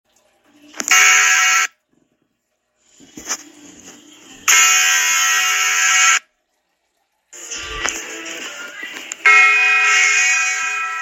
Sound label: bell boong